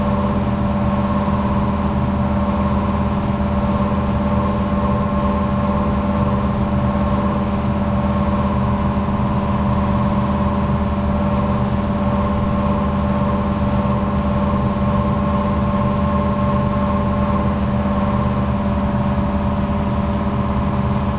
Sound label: air conditioner unit running